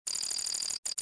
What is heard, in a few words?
fuelpump